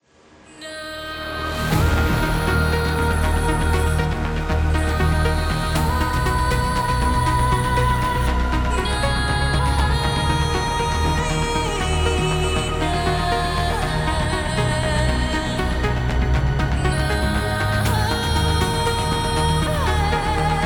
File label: Credits Music Epic; Encode